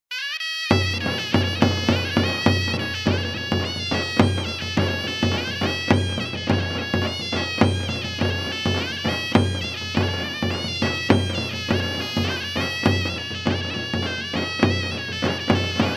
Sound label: kurdish music chub bazi sorna